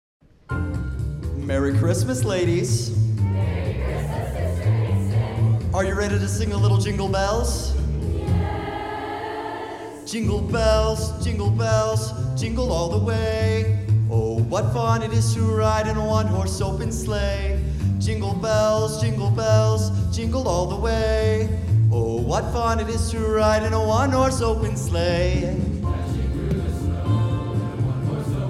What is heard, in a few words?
INTERMEDIATE HIGH SCHOOL CHOIRS; SENECA VALLEY; JINGLE BELLS